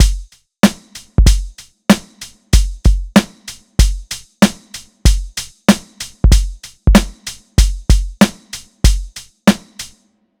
Boom Bap; Boom Bap Dynasty

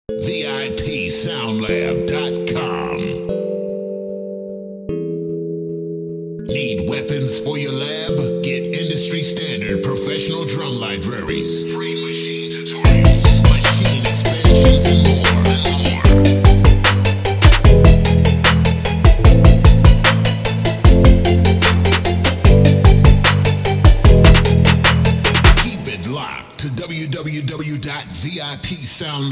Intense Drums Loops and Melodics

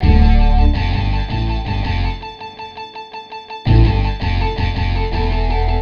Sitar Rock Sitar

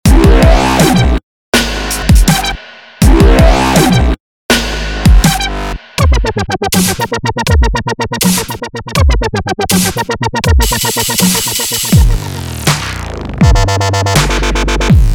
Dub; MVP Supreme

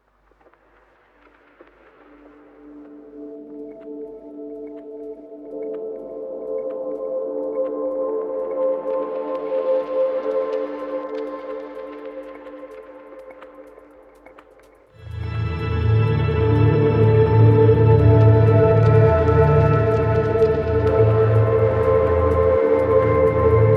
Ambient Soundscapes

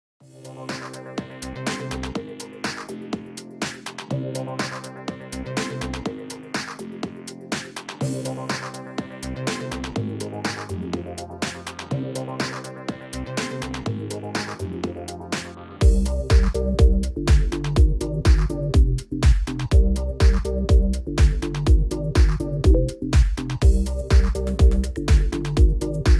Deep House Massive Presets; Loopmasters; Nu School Deep House

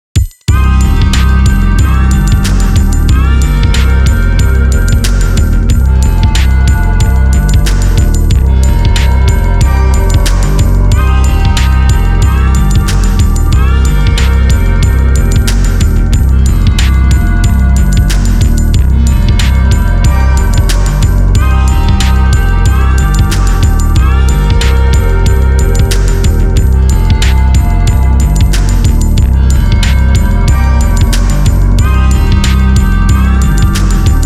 Atmosphere

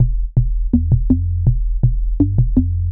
Is that a kick

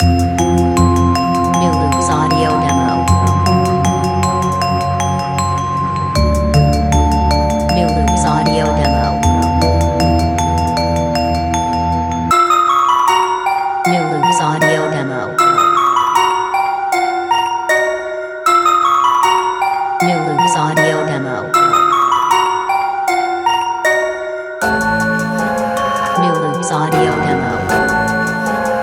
Halloween Trap Kit; New Loops

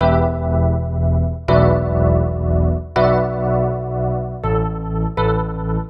E Piano Wurli Rhodes

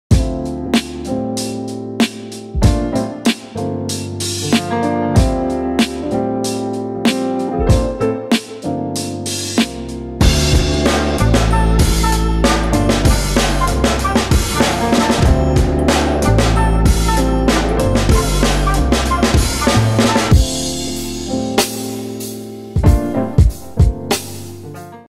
Jazz Hop Classics Hip Hop Samples Breaks; Freddie Joachim; Loopmasters Artist Series

